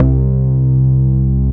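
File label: SYSTEM700 BASS; Rubber Chicken Software Sample Bank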